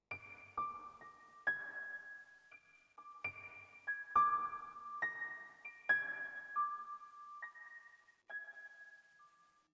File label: One shot Piano; zombie army 4 dead war; ps4 stadia switch windows xbox one